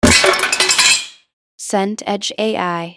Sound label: weights barbell drop